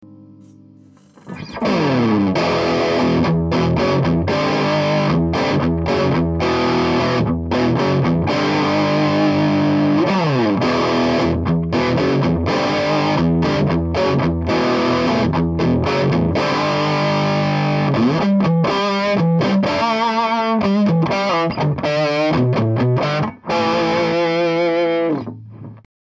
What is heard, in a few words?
es335 mid cut v30